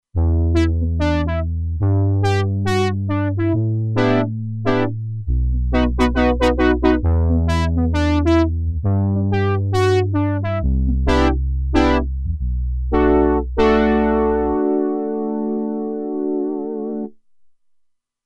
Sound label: Brass Horns, Roland Alpha Juno-2; Original Sound Chart patches